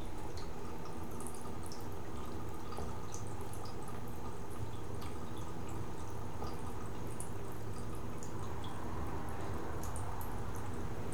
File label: Drizzle; Weather